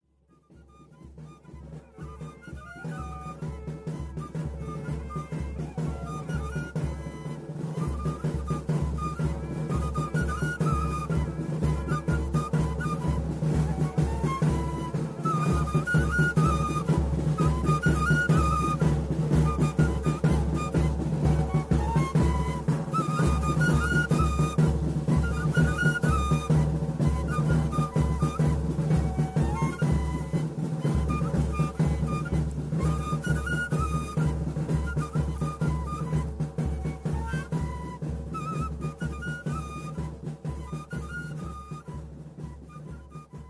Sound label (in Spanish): Toque Pasacalle para Caballos